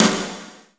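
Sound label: CROSSTICK RIM, snares; Instruments & Percussions; InVision Interactive; Lightware VOL-1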